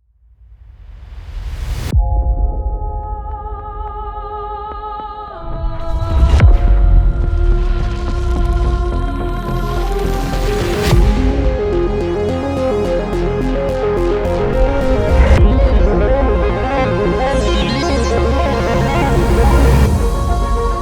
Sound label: mechanical symphony; JourneyToNowhere